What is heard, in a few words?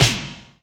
Snares & Claps; Vengeance Samples